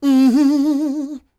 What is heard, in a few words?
MMM, Vocal Blues Soul; Spectrasonics; Planet